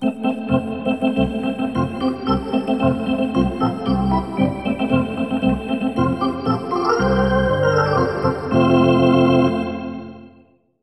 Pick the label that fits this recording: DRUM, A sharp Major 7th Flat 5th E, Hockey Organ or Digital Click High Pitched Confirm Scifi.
Hockey Organ